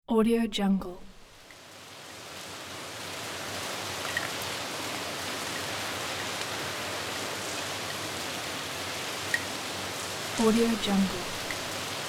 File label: gentle rain